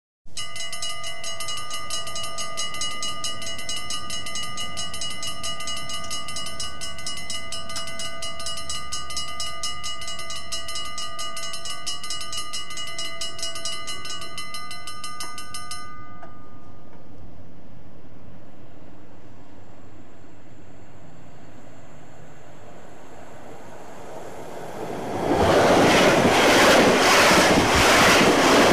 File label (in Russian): zvuki zheleznodorozhnogo pereezda